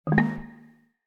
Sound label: Call Undeafen; Medal